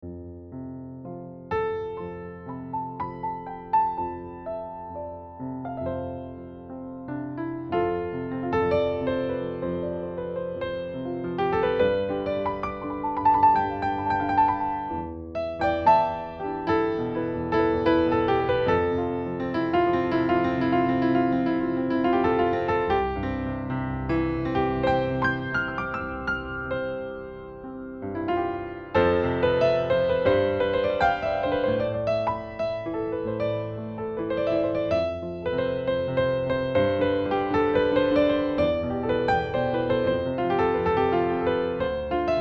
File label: piano riff